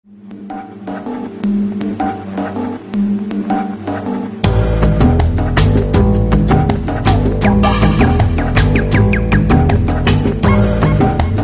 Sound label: perfect jungle